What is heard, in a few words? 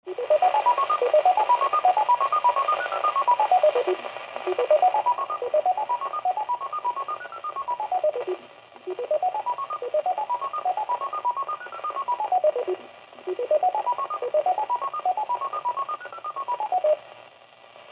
Fox Melody, Foxhunt; This is close to what you should hear once you get within range; KE5RS Foxhunt